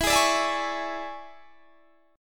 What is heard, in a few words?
E 13th; strummed; 24,23,24,21,21,21